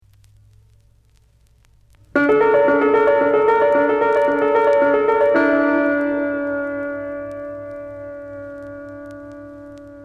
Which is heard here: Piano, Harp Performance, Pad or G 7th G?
Piano